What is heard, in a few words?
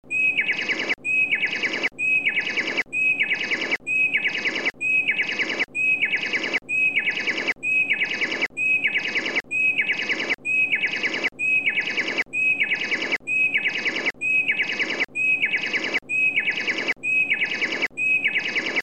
Bird tweeting tune